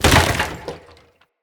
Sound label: lantern crate land; Objects; Ode Lantern Crate